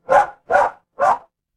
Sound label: animal dog bark